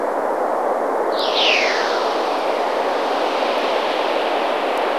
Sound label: cluster, Cluster Whistler